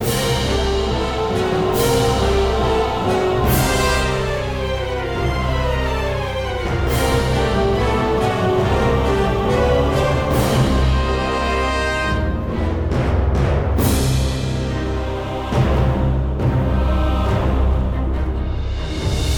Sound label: Hell Spawn Loop; BRPG; demonheart hunters